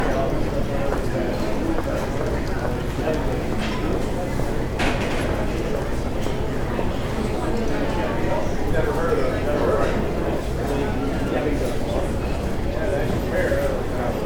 airport cafe

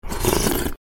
drinking a soup; cooking eating

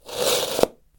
wet tissue

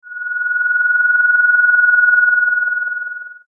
tinnitus; horror

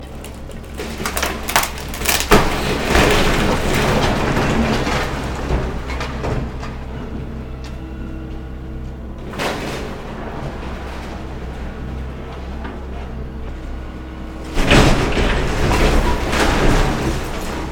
demolish house

